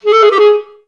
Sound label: G#3, orchestra; Best Service; Peter Siedlaczek's Advanced Orchestra